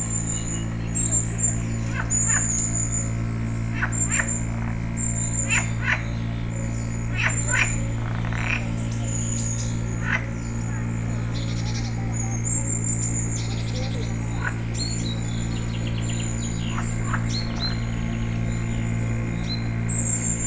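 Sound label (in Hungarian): röcsögő béka berregése mellett, béka; Tiszababolna; termeszetben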